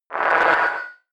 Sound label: notification muffled distortion ring; kommunikation; signale